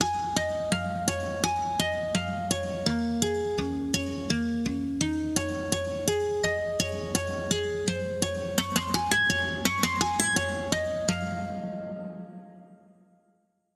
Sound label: pad